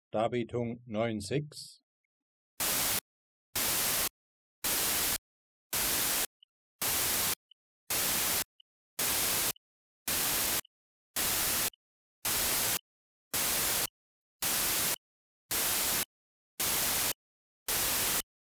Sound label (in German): Nachverdeckung, Weißes Rauschen von 65 dB; Weißes Rauschen von 65 dB Schalldruckpege